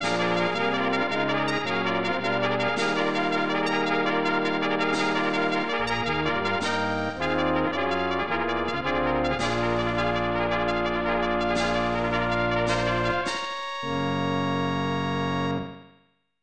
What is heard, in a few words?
G1 Fanfare